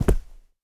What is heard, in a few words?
object fall impact